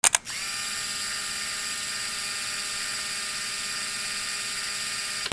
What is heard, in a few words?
CAMERAS